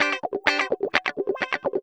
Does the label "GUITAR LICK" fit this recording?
Yes